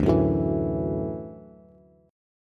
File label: D-Major Add 9th D down Bass; strummed; JGuitar